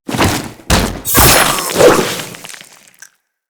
Dual Sword Stealth Hit; Finishers; Stealth Hits

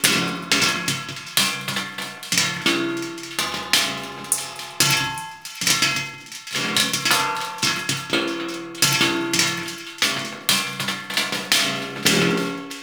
Metal Taps Loop; Ambience; Props; Warframe; Entrati

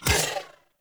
Dodge Impact; Warframe; Pacifist

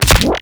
Weapon Punch Shotgun; Warframe; Weapons; Shotguns

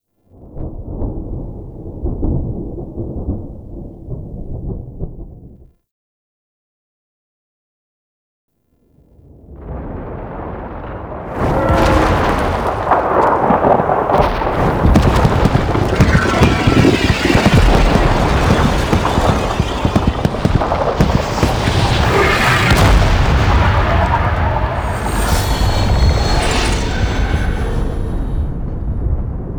Landing Debris; Cinematics; Cetus Landing

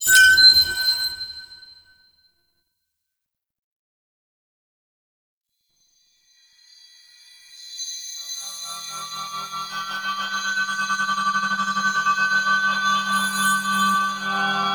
Death Crystal; Cinematics; Archon Death

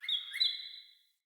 Cardinal Male Bird Double Chirp

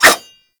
Dagger Whoosh; Warframe

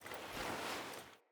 Cub Select Ending Envoy Foley; Orengall Quest

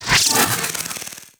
Micro Spin Attack; Warframe; Corpus; Arachnoid Micro; Abilities

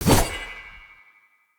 Long Sword Whoosh Heavy; Weapons; Player; Swords; Pothia

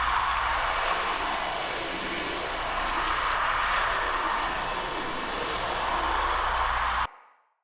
Casting Device Block Loop; Weapons; Player; Casting Devices; Galene

